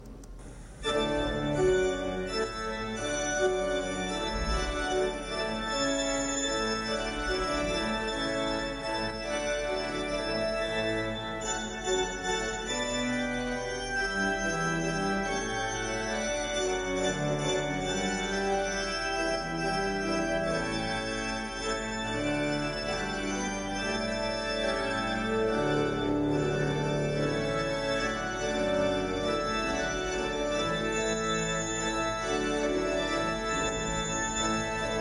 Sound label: Hornpipe